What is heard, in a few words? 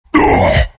bear form pain; lone druid